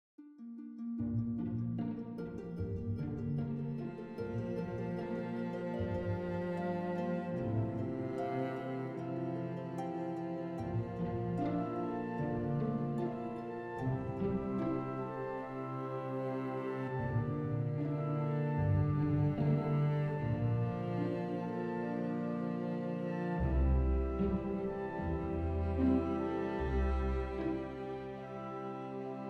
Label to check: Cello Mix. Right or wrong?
Right